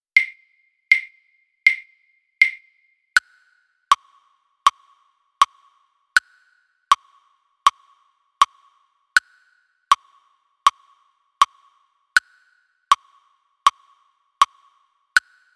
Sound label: click; had; snake